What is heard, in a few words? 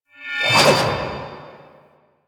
Sword Melee Heavy Charge; Enemies; Weapons; DE; Warframe; Teshin